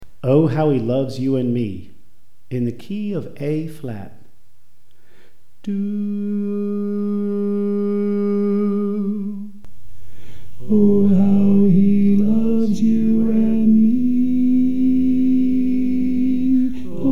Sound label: Alto